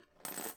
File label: coins, Foley; Varazuvi; California Foley